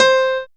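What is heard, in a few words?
piano C, House Selection, Dance Synths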